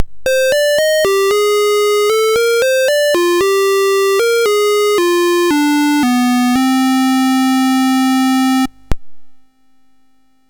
Dragonworld sid MOS6581R4

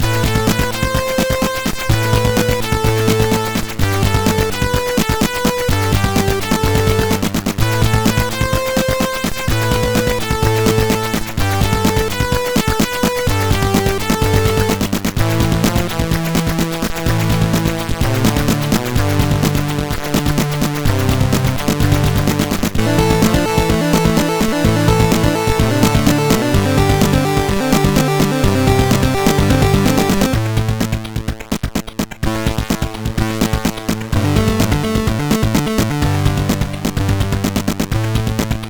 Zound Muzak, sid MOS6581R2

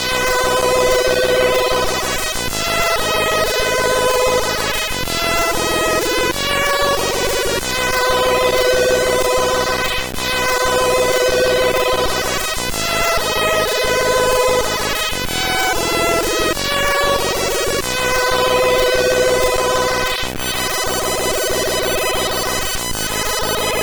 Jingles; hvsc

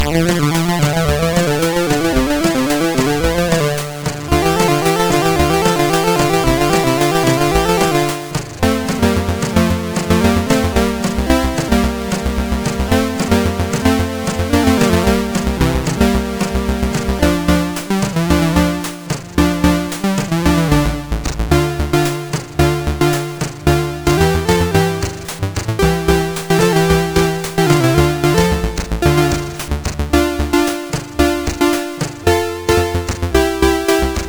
Paddle Mania, CSG8580R5

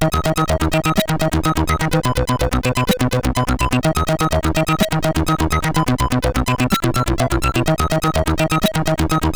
tune, CSG8580R5

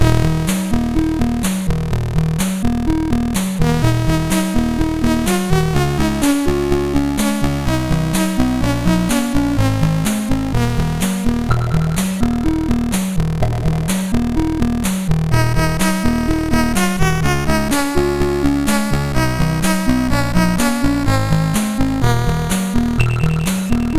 Gametune, MOS6581R4

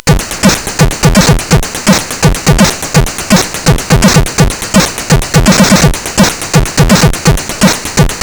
drum example; CSG8580R5; GoatTracker

